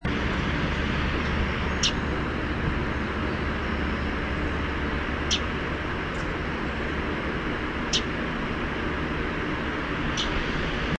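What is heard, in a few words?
Common Yellowthroat call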